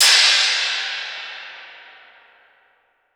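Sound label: spiral cymbal crash; Voice Crystal - Steve Reid Definitive Percussion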